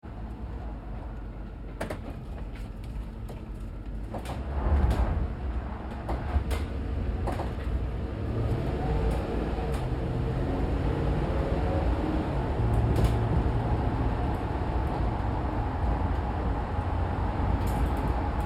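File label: Truck GMC Savana Interior Drive Accel Decel Slow; Trucks; Big Room Sound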